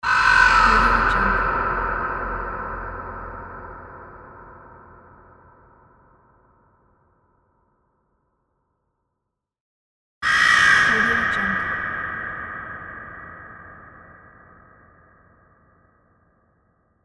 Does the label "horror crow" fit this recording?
Yes